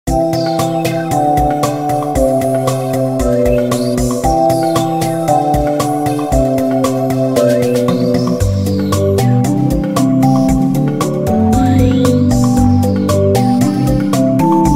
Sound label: Snow; SMM2; SMB3